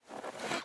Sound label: Sofa Sit; Sittable